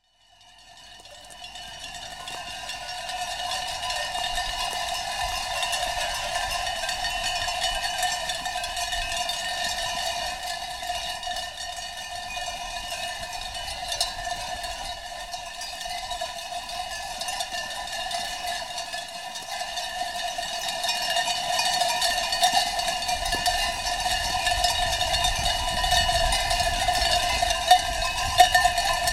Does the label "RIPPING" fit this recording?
No